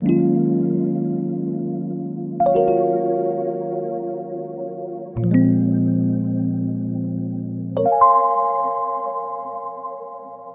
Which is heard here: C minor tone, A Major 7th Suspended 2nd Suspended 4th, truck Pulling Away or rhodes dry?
rhodes dry